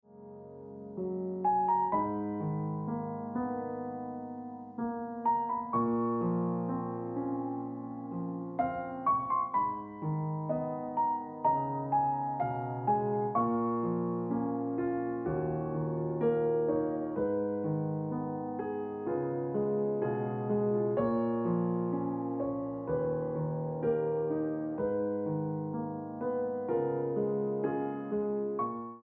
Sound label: Piano Music